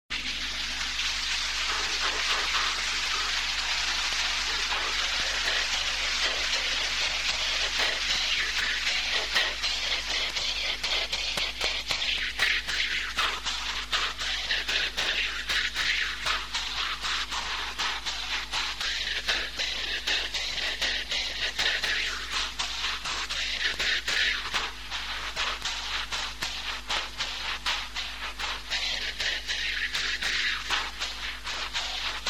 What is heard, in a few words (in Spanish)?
Trömpe melodia; cantos tayiles